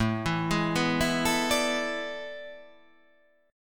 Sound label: A Major 7th Suspended 2nd Suspended 4th